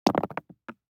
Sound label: DICE on Neoprene, Throw and Roll, Standard, 2 Two Dice; Samples; ArticulatedSounds; DICE Sfx Party (Extended)